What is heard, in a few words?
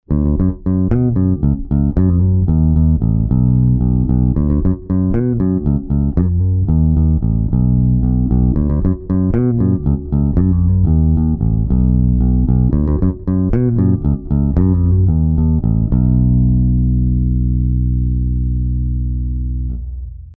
room deep